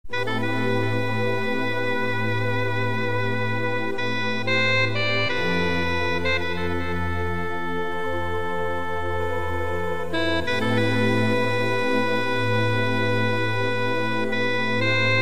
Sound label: Sounds of a Sax